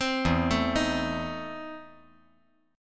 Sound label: E Major 7th Suspended 4th Sharp 5th